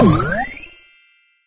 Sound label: pickup powerup; subway